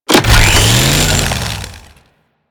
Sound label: Saw Attack Rev Heavy, Melee Weapons; Warframe; Grn Ghoul Saw Player